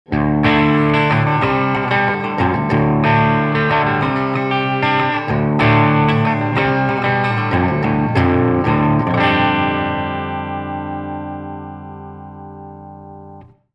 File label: Amp 3 Channel B